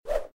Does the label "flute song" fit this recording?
No